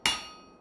Metal

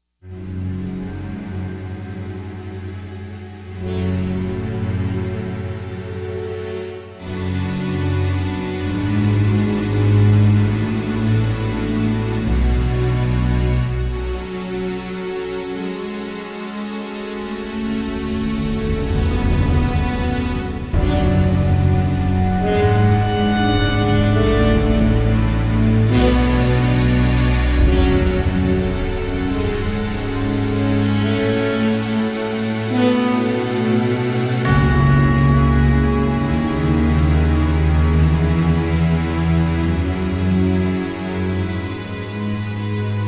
Dark Calm

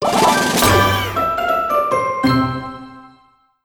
Crunchy Finish

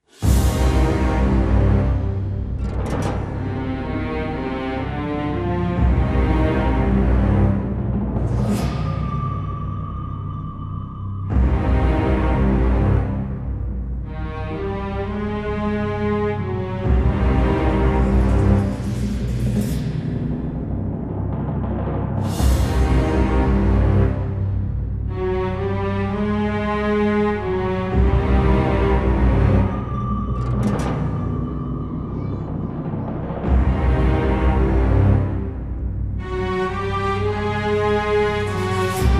Defeat; World War III: Black Gold

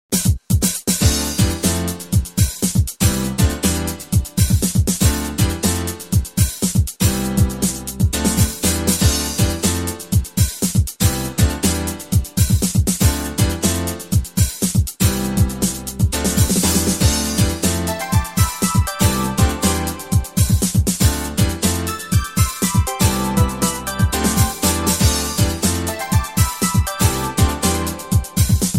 Dressing Room; pretty girls mahjong solitaire gamerip

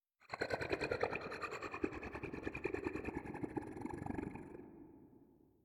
Creature; We Are the Dwarves

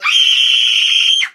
Scream Female; friday the 13th killer puzzle

